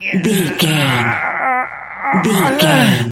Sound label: voice male effort push slow